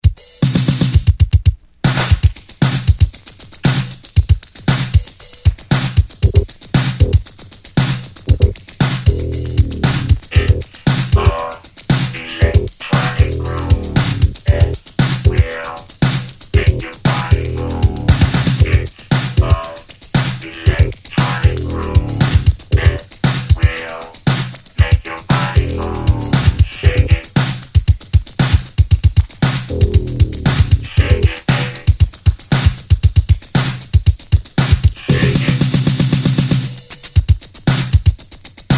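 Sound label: hip hop, Beat Scratch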